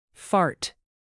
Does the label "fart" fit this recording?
Yes